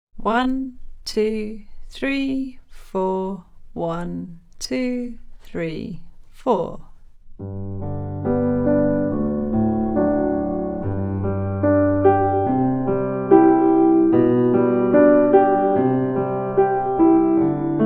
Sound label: G major scale backing slower